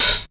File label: HH OPEN; Big Beat Samples & More; AKAI; HHOPEN1 KIT